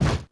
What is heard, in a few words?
item take